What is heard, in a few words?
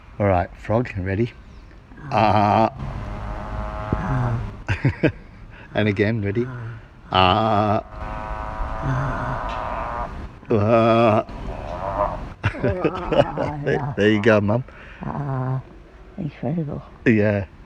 frogs; mum talking to the frogs